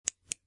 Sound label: bets Calculator Switch